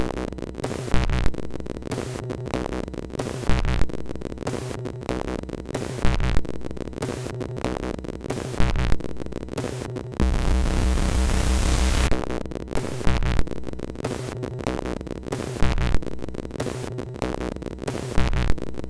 sid MOS6581; hvsc

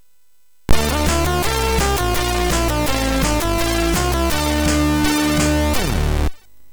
Music Demo Version, MOS6581R3; hvsc

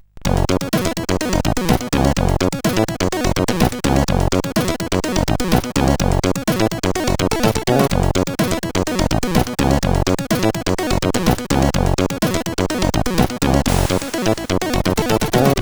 Powerful, sid CSG8580R5

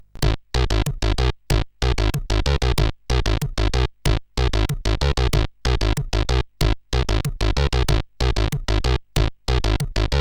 Techno Tips, CSG8580R5

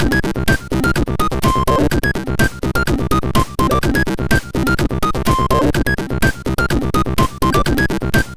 Tribal, MOS6581R3

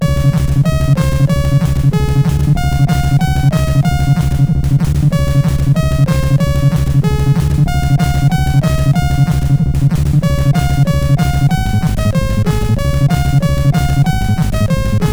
Techno Koleda, CSG8580R5

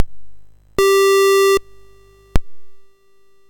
Dragonworld sid MOS6581R4

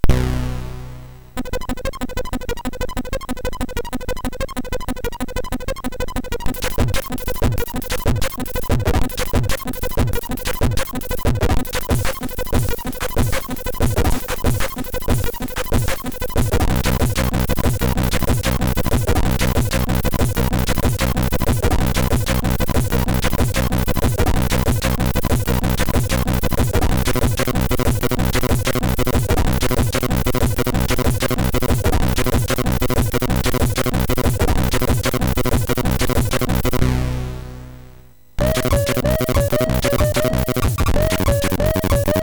Intromusic, sid MOS6581R3

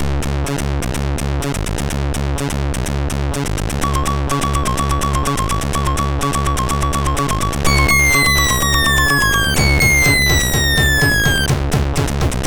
Yippeeeee sid MOS6581R2

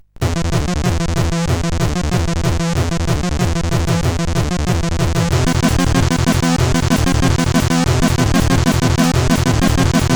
Arabian Techno, sid CSG8580R5

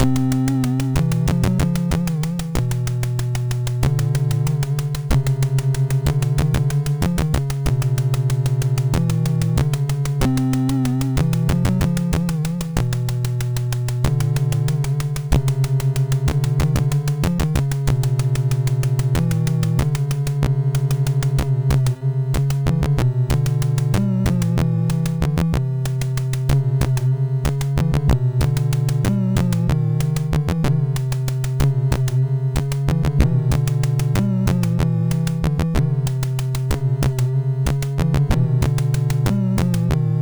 MOS6581R3 sid; Grave Digga; Dexter

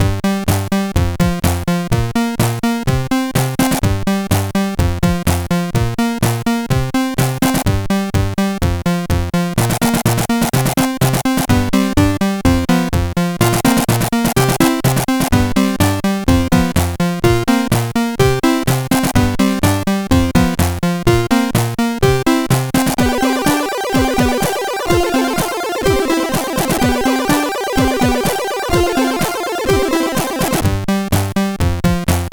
Quick Tune, CSG8580

